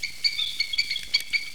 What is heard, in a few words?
eagle